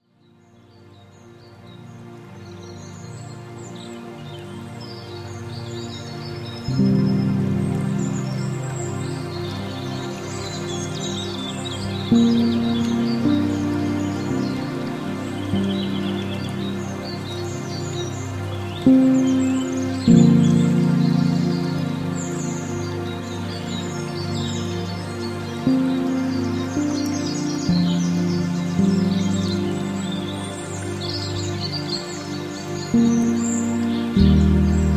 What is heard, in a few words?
Woodland Ambience